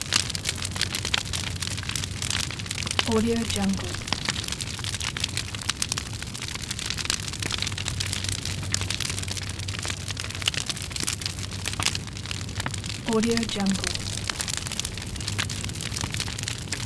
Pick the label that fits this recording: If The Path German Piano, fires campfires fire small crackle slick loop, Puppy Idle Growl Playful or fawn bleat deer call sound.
fires campfires fire small crackle slick loop